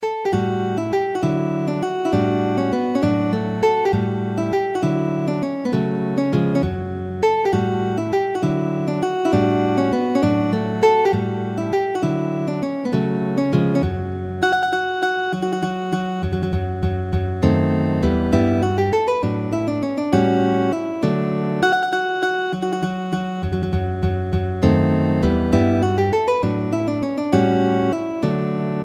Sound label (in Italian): valses, guitar